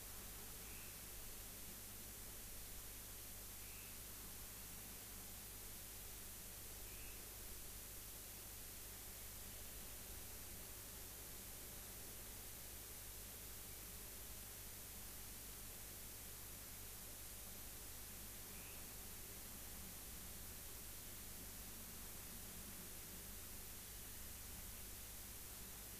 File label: rayol farm; SEAMED